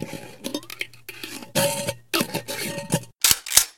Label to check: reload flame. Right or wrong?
Right